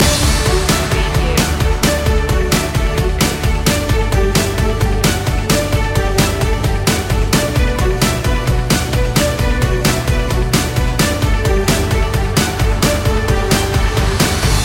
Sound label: sports hero loop